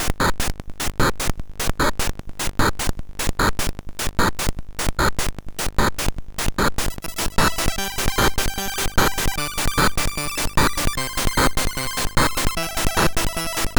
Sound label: Tension Remix sid MOS6581R4; Nitro Pulse